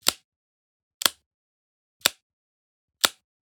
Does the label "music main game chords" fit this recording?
No